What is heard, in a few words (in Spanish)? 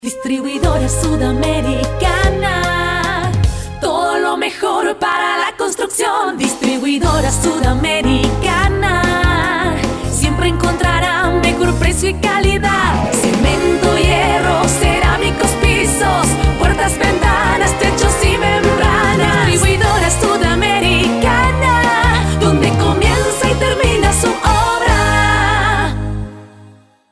Jingle; Distribuidora Sudamericana